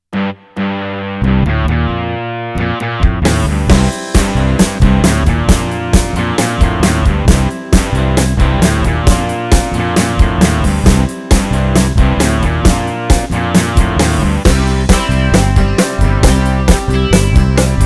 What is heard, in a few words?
ROCK